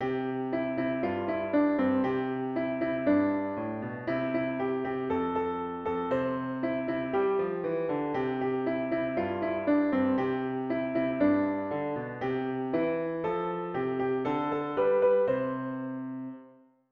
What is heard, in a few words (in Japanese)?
ピアノ